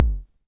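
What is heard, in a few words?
mattel tom, Mattel Synsonics drum kit, lo fi; 8 BIT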